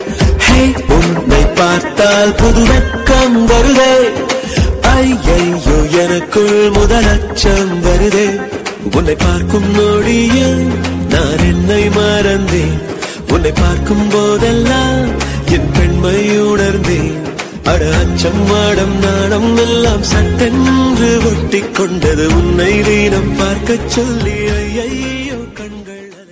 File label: tamil serial songs; zee